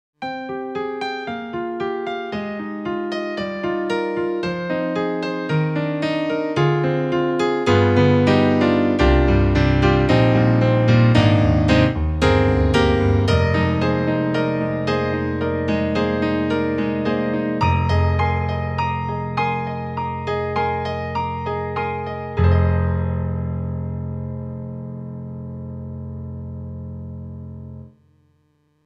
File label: KORG X50 Acoustic Piano